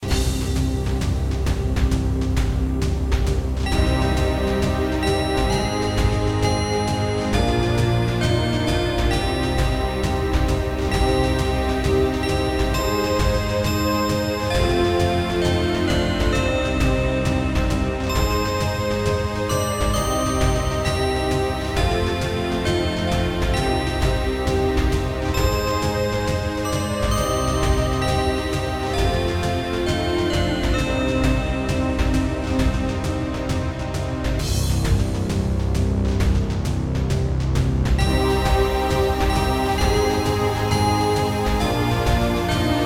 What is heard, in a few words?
fantasy forest battle; breakhack